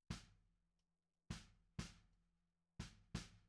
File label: Snare Bot